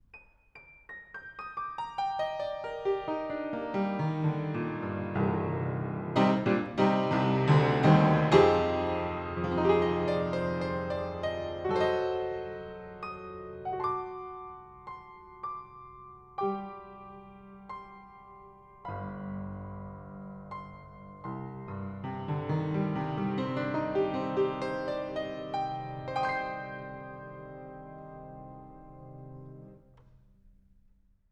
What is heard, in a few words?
upright panels off 1m pair